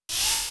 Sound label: Robot screech